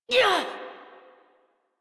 Exert, Adult; Melee Swing; en; Warframe; New War; Adult Operator

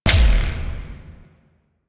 Shotgun Proj Die; Weapons; DE; Warframe; Tenno; Pod Shotgun

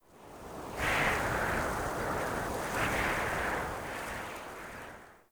Mountain Wind Gust Whistle Soft; Ambience

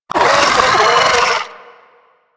Amalgam Moa Satyr Vocal Attack; Warframe

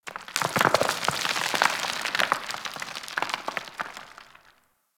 Mining Rock Debris C; Warframe; Ambience; Grineer; Grn Mining Shaft

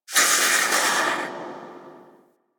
Ship Machine Steam Blast; Warframe; Corpus Ship Remaster

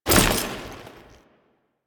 Foley Step; Warframe; Kahl

